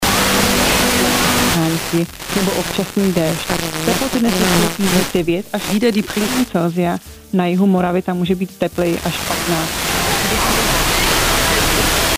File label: czech